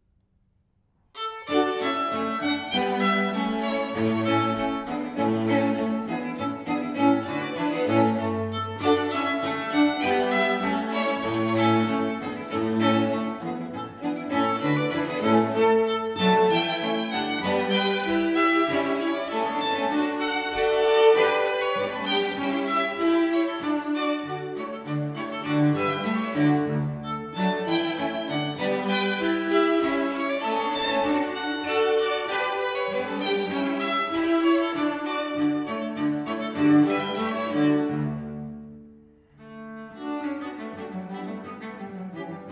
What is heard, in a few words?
Menuetto String Quartet in D major; Haydn - String Quartet in D major, Op.20, No.4 - iii. Menuetto